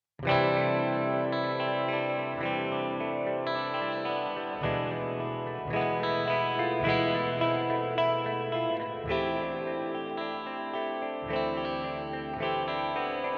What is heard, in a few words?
Hall Reverb Source Audio Ventris